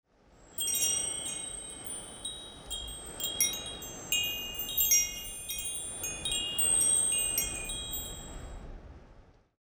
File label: windchimes